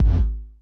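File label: FX ROOM Kick 909; Masterbits - Soniq Elements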